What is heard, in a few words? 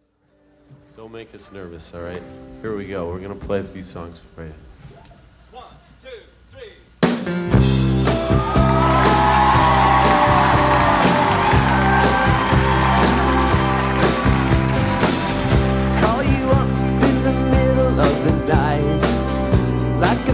Acoustic